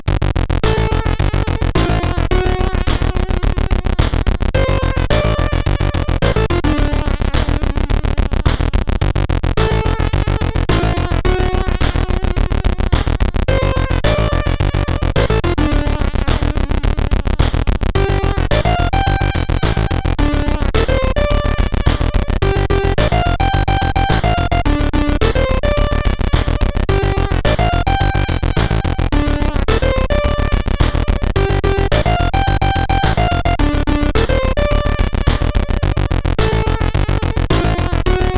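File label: Lights On, MOS CSG8580R5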